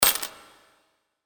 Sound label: coin